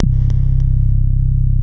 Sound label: WALL MOOG, Synthy Tonal; Distored Reality